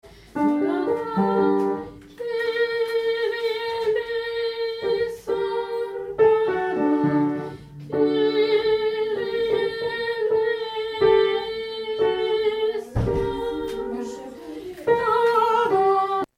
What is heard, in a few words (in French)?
Kyrie Soprano